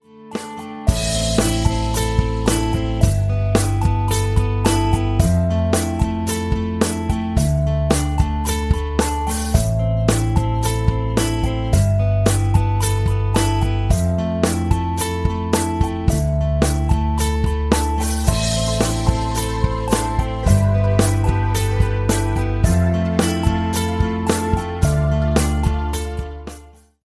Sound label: super tone ringtone